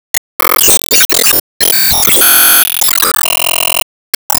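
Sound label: Sound Effect System Time attack Final Score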